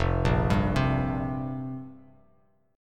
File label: F Suspended 2nd Suspended 4th F down Bass Standard; arpeggiated; JGuitar